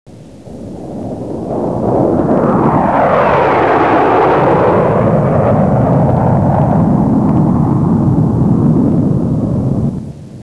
flyby; 50 Years of Gs and Jets; jetage; ambience

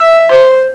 door bell; Halloween Night II

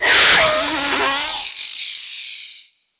barracuda die; DAoC

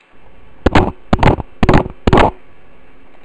Cavalry Charge; Macworld (1997-09); Games World; Imperialism Demo; Tactical

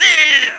DEATH; QUAKE2

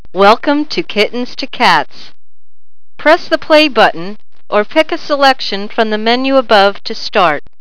kit; Kittens to Cats

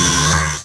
Electric; Curse of Dragor

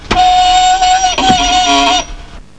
CREAK

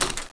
generic, impact debris; SINDEMO

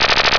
GUN; Death from Above

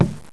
Ball Bounce; Presenter Professional; PrePro 3.0

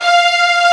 marcato

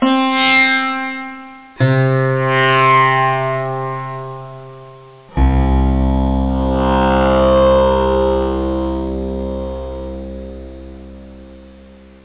sitar hi; kcs 35 demo

